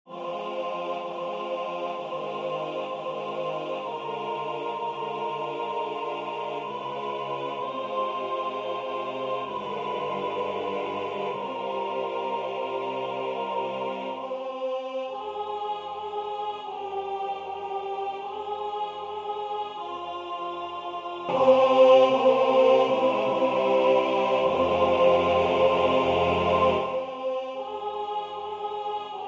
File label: Go down Moses SATB; Gunsenheimer